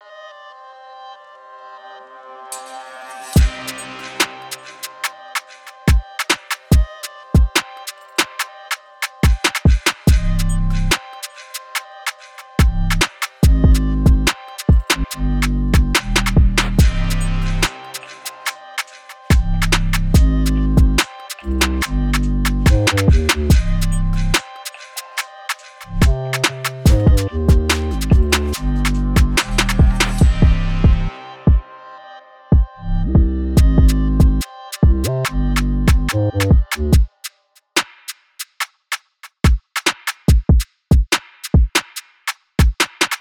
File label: Drill Template; Song Templates by Soundtrap